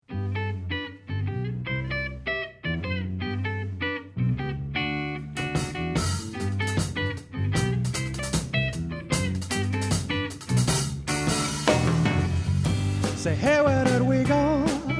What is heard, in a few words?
big band